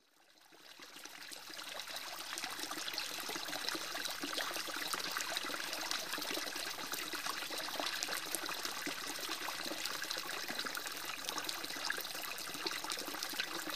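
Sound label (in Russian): фонтан в пруду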